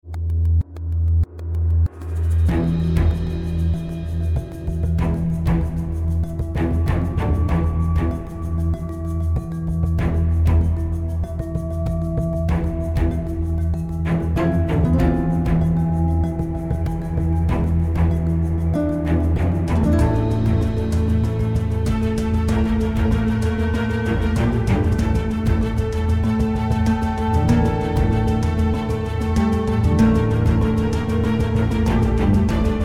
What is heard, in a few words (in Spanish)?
orquestal drama accion